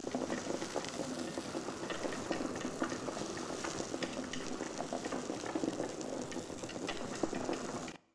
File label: conveyor rocks; American Fugitive; SFX loop